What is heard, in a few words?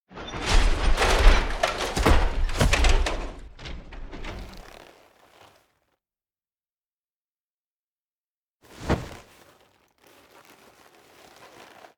Shot; we are the dwarves gamerip 2016